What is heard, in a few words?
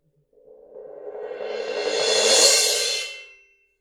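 suspended cymbal roll; パーカッション; サンプリング素材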